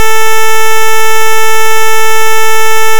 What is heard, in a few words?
Pulse Wave